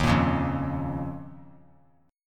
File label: Eb 7th Suspended 2nd; Eb7sus2 strummed; JGuitar